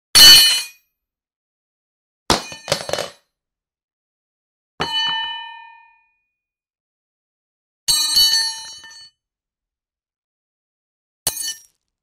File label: copper hammer; چکش مسگری